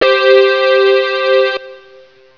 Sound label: GAME END